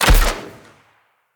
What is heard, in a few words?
Bow Shot; Sinecure